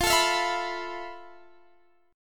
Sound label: F Major 7th Flat 5th, F, down, Guitar, DADGAD